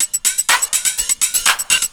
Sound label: loop; SoundFactory; SMPMANIA